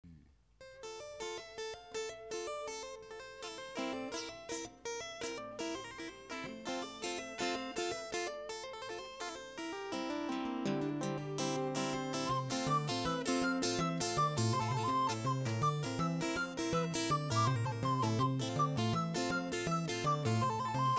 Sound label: music box